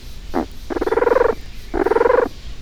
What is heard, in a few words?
Leptodactylus chaquensis